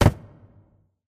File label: phys cube, physics